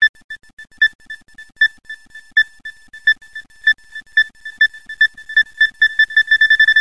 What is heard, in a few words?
beep; svencoop; quad2